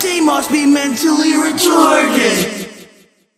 backstab; tf2; freak fortress 2; new spookmaster

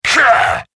attack; slender; marauder

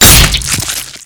hit; slender; marauder

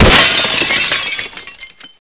bust glass, debris; svencoop; AoMClassic

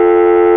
tone, ambience; svencoop; blackops

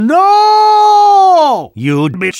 stabbed; tf2; freak fortress 2; gamblerv2

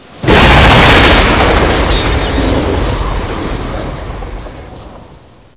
passby, ambience; svencoop; blackops

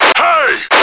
chase; slender; chaosinsugunner

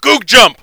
Jump; tf2; freak fortress 2

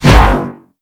miss; slender; marauder

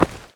step; slender; chaosinsugunner

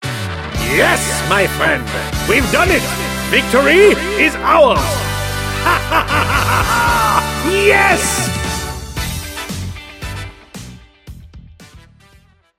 victory; tf2; freak fortress 2; gamblerv2

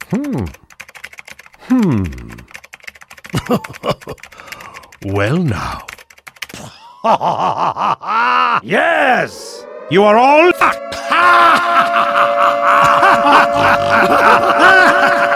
nuke siren; tf2; freak fortress 2; gamblerv2

